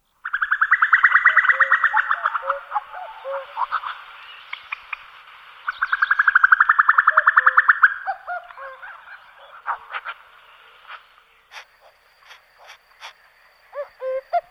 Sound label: cucu